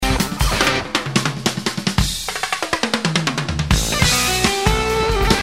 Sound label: Fill, Drum Sounds